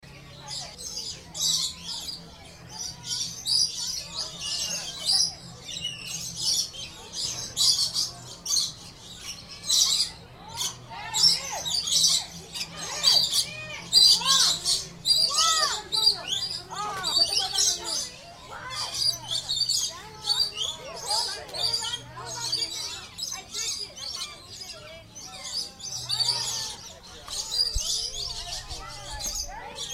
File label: aquarium lorikeet exhibit